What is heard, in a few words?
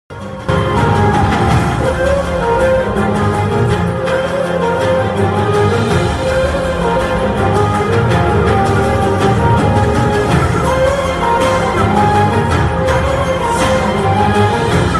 movie interval bgm ringtone telugu movie ringtones